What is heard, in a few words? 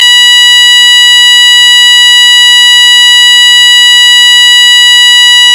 Linear 970